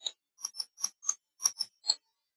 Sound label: Glockenspiel